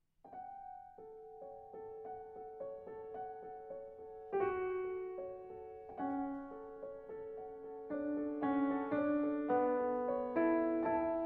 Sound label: Nocturne For Piano Solo; Benabdeljalil; No. 4